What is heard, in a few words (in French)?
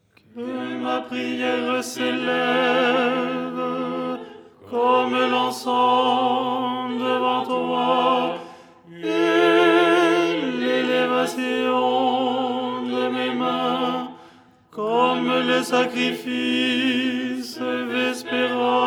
tenor; " Que ma prière s'élève…"; Les 8 tons en français; ton 4